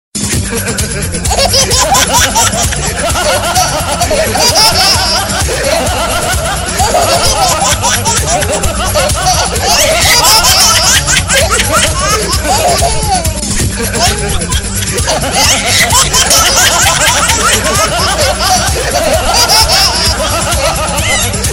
bebe dando risada

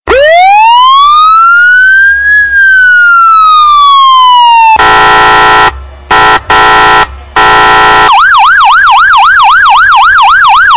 sirene de Policia

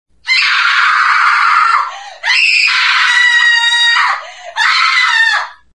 mulher assustada

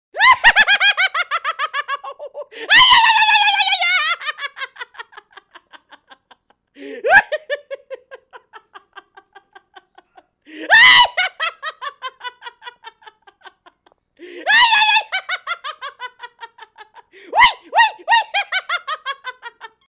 maluco arabe rindo

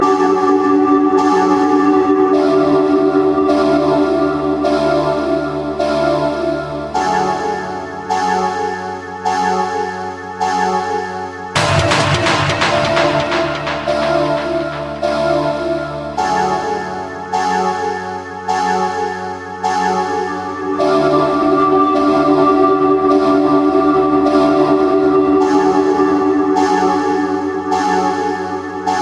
efeito suspense

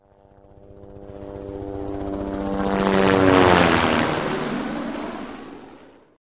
helicoptero

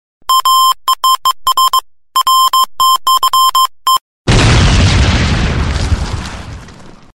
bomba detonando

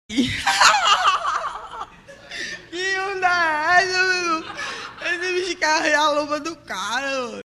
risada de maconheiro

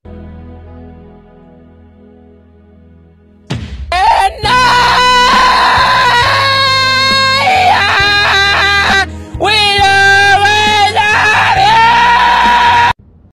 nordestino cantando musica

